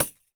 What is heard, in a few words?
percussion, Roland TD-20